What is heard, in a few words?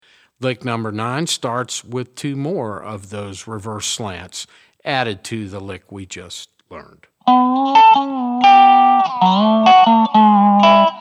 resonator pedal, steel guitar